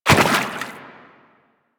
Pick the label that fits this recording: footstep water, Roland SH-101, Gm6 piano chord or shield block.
footstep water